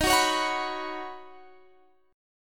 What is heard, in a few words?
Eb Minor 7th Sharp 5th Eb Guitar Drop D